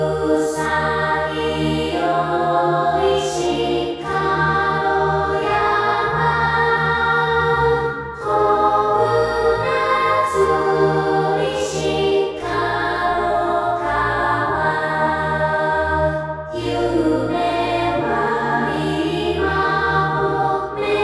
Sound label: choir